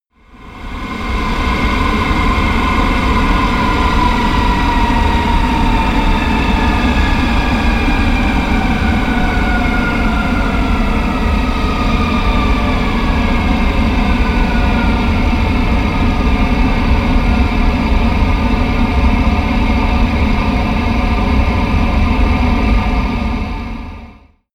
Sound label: plane airbus a320 turbine slow down